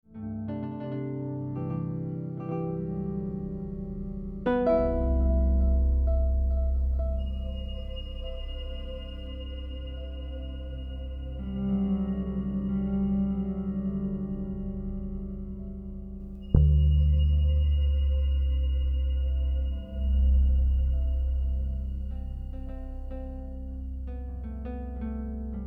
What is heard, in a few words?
Color Song Interlude